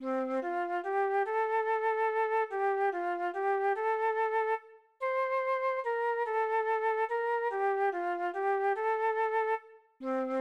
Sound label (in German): Musik Hallel Melodie